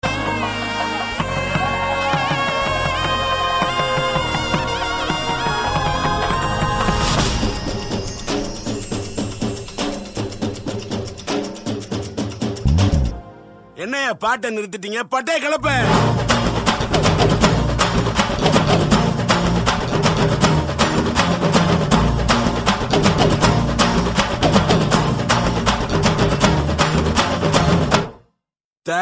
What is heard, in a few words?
Beats